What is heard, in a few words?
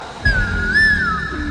Happy Msg